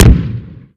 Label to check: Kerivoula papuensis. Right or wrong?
Wrong